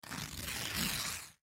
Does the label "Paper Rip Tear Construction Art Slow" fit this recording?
Yes